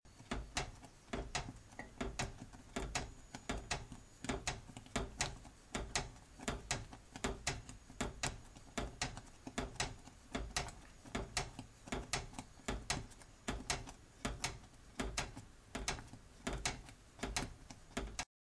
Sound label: stirring porridge